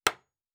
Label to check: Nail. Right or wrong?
Right